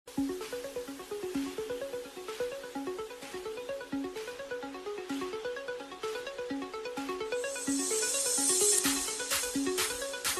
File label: electro ring